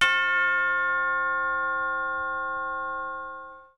tubular bell low

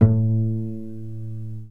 pluck, cello

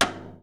tom wrist slap

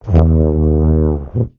baby didge, tuba

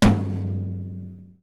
tom single

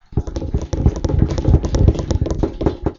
valves, tuba

